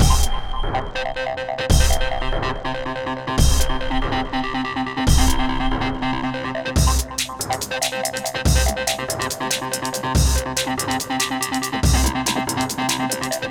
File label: Hardcore Breakbeat, 142 Cmin; Big Fish Audio - Hardcore Breakbeat Action; Method Machine 142 Cmin